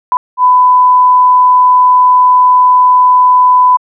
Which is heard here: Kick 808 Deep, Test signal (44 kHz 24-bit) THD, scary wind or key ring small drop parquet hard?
Test signal (44 kHz 24-bit) THD